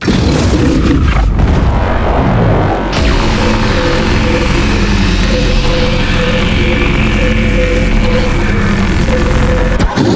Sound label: Beam Strong; helltaker